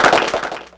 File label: Clapbox Pitch4; Ableton Foundation FreePacks